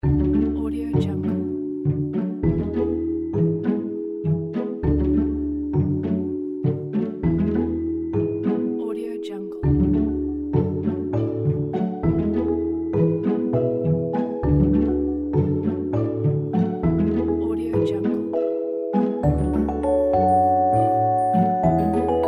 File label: adventure cartoon